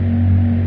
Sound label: hoverboat thrust; There; ThereClient